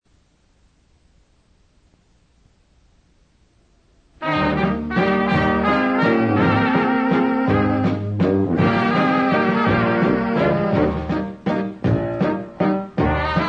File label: waltz